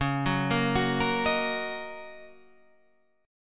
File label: Eb Minor Db; Ebm/Db arpeggiated; JGuitar